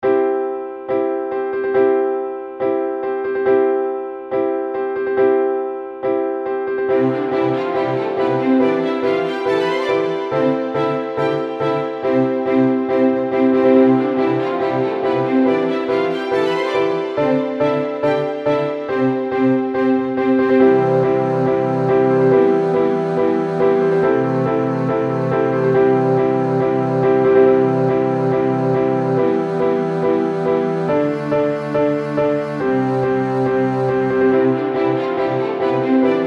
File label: violin